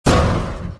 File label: head land